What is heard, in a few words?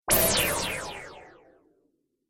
Laser Swish Delay, whoosh sound effect